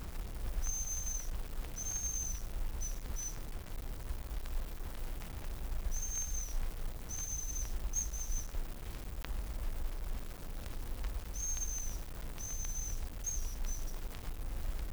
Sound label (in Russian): ryabchik imitaciya